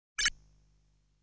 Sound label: Shot sound